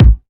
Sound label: fluffy kickdrum sample a sharp key